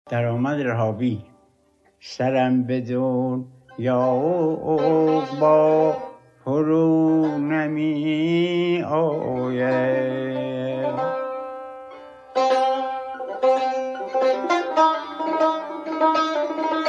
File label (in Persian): daramad rahavi, shour